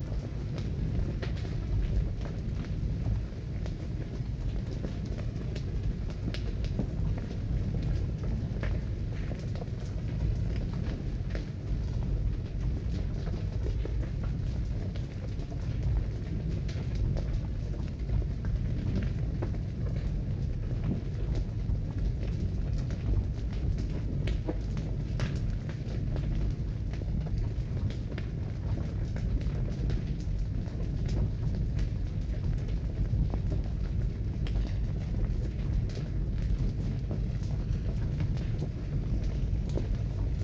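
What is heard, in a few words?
ambience lava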